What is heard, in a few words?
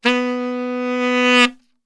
B 2 SAX, Brass Super Section; Best Service